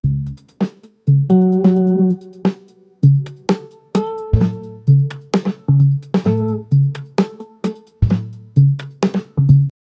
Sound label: lo-fi countdown vibe